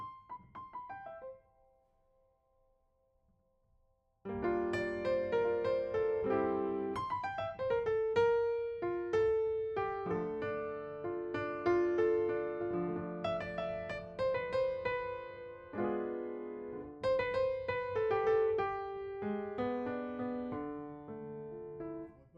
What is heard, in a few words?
Piano B